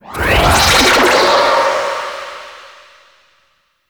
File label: Banish Whoosh; Warframe; Magician